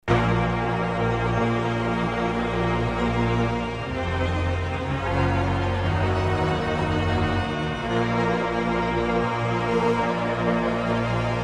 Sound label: Instrumental